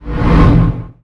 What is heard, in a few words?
Menu Backup Whoosh; Menu FX